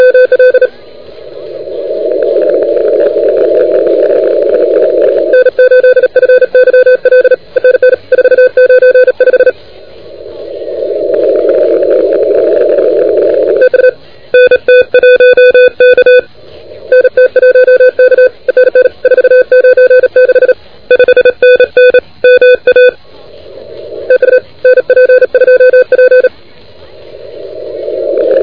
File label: CW 28MHz; ARRL10M